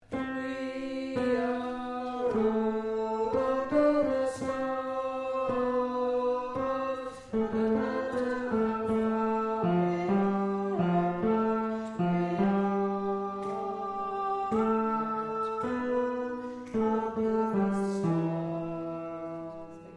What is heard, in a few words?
tenor